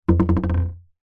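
leisure ball rubber gentle bounces fast on hollow wood surface; zapsplat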